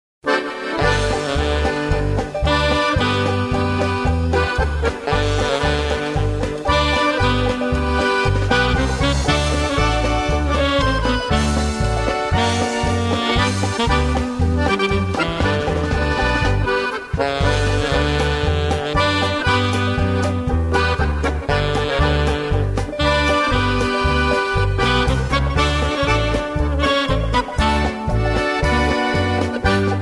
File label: Hawaiian Polka